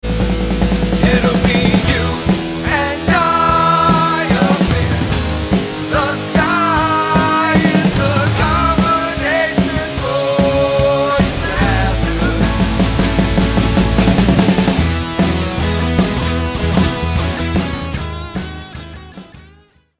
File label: ROCK LIVE